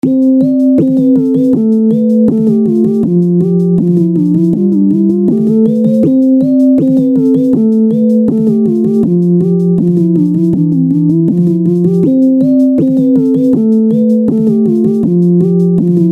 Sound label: Piano Test